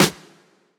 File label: fillin